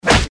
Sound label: eastern fist hit seq part1